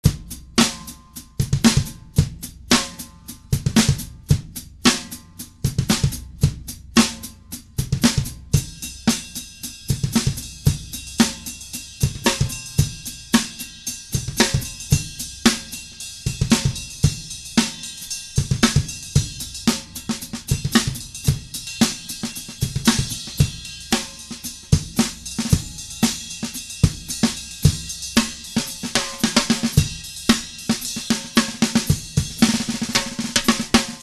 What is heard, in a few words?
beat loop